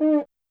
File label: Cuica Open; YAMAHA RM1X